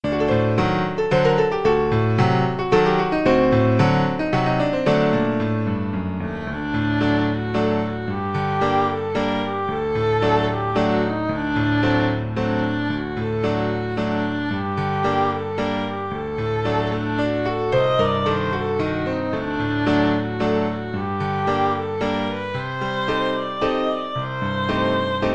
Hora violin; Shiroh

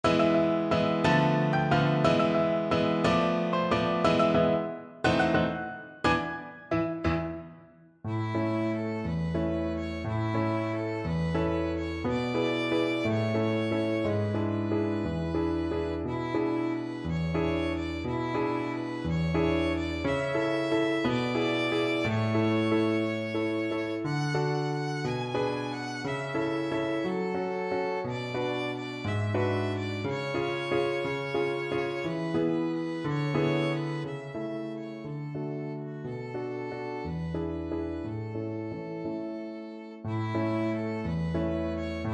waltz violin; merry widow

